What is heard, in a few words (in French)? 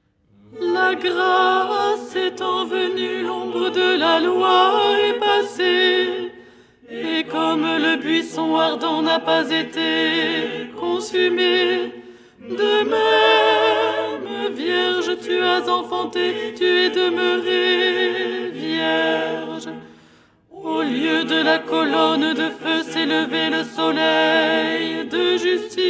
alto